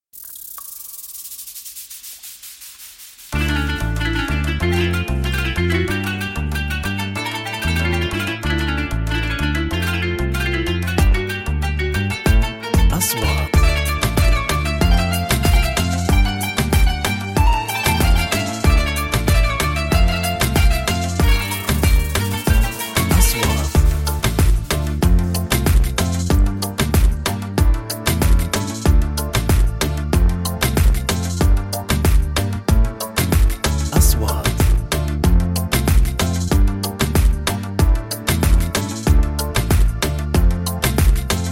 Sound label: Monerah music